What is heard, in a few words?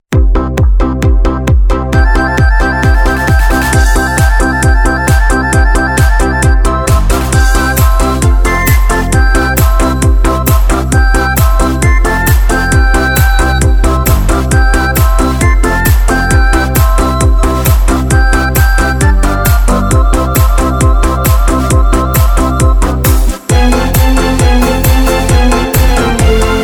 Korg Pa3X